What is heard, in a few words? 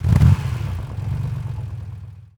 warthog; vehicles; new warthog right